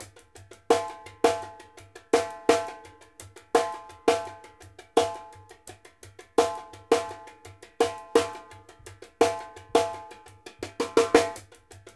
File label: HAT + SNARE, 84; Sampleheads; New York City Drumworks VOL-1; SP BLASTX 84